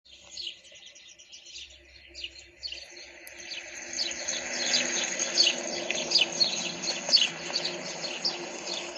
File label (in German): Spatz